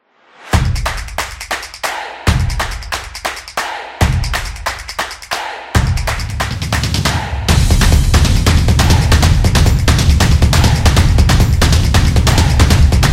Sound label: Drumming Beat; sports beat